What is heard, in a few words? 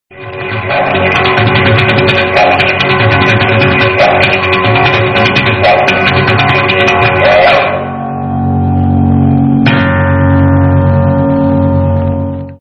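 didgeridoo bass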